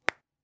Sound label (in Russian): hrust palcev